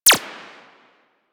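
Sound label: machine gun tech; Weapons; Warframe; Archwing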